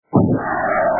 rocket

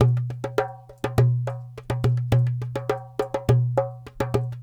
Dumbek

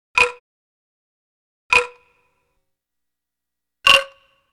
electronic bonk; music electronic; Asian Show Sound Effects

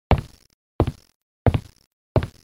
slow steps

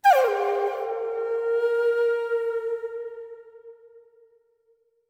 Echo Flute; Flutes

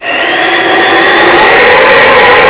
wind

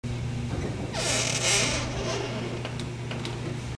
squeaky door

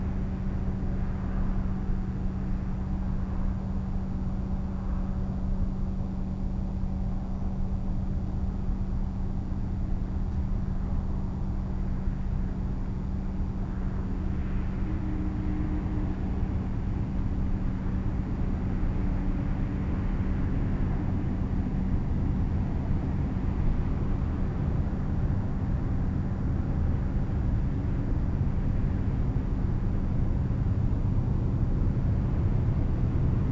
train; Ambisonics

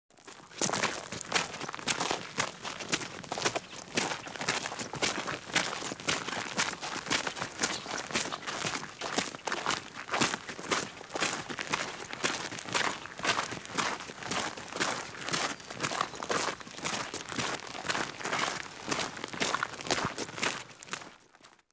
footsteps on a grass; Grass and Dirt; Butterfinger Video Sound Effects

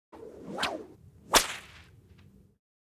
crack bull whip; fights bodyfalls; Asian Show Sound Effects

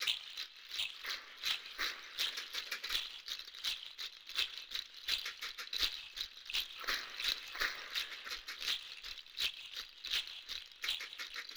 Water Mix; Crow Hop (Weemmarategwa)

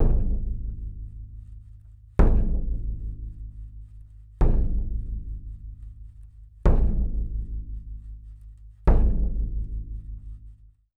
Painted drum; War Cry (Cayovinto)

